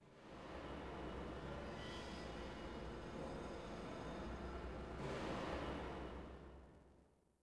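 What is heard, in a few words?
train Tunnel interior Noise; Star Wars Obi Wan; XBOX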